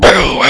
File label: pain